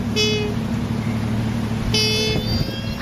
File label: koalas fighting